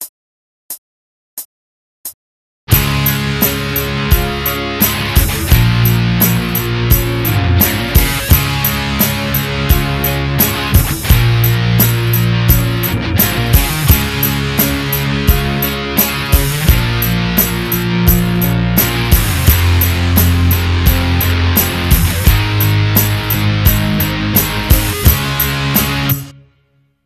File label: jamtrack